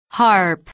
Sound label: harp